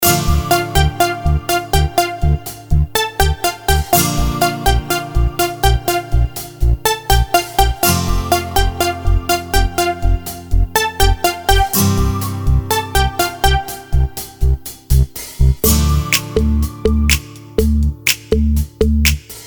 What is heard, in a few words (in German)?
schlagerfarben